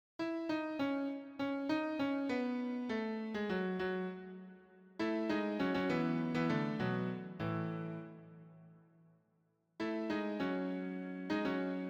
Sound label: playground for piano